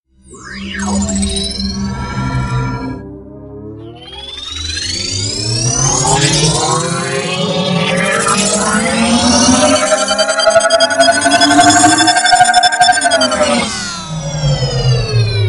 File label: UFO